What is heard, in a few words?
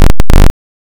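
waveform FM square sine silence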